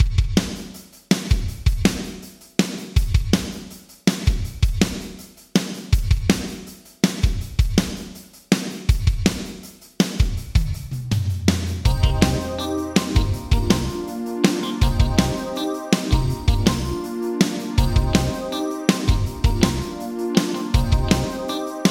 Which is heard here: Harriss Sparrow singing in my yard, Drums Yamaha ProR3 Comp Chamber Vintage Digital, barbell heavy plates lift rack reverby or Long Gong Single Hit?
Drums Yamaha ProR3 Comp Chamber Vintage Digital